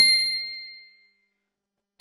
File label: correct ding